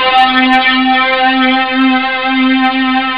elec guitar; Amiga Soundtracker Sample Packs

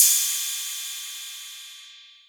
808 Cymbal; Drums

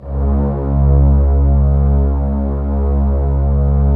C#2, Violin, LOW VLNS; Q Up Arts - Denny Jaeger Master Violin Library

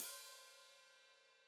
Hat Foot Open, Hi-Hat; Deeply Sampled Drums

